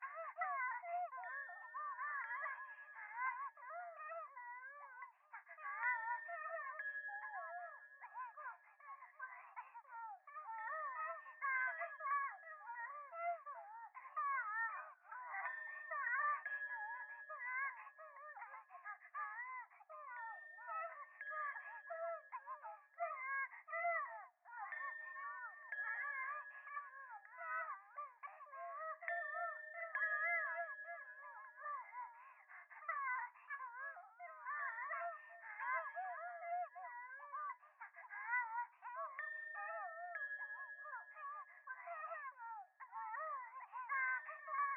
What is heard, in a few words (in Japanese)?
電話 可愛い喘ぎ 寺, ASMR